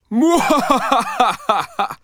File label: Emote Male Evil Laugh; Idle Champions of the Forgotten Realms